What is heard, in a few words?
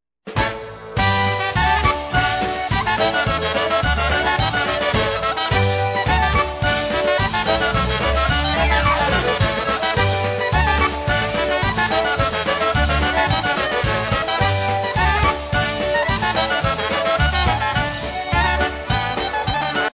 waltz